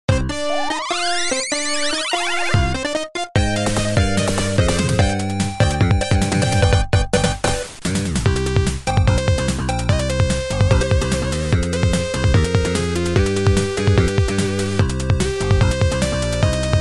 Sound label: TV Opening; Kagaku Ninjatai Gatchaman; PC-98